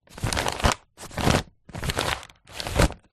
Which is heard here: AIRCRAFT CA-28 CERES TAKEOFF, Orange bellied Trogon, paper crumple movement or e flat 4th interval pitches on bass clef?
paper crumple movement